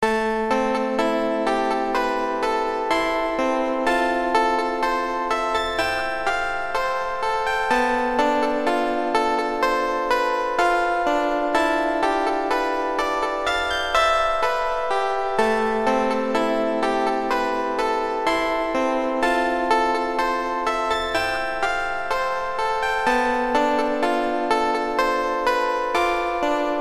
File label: hum New INSTRUMENTS